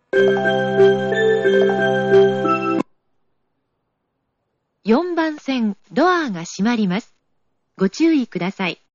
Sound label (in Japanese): hachioji melody; 即切り; 中央線快速電車 高尾方面 ■中央本線 高尾・大月・甲府・松本方面